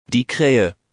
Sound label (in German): Kraehe, wildvogel